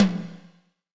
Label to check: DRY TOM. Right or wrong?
Right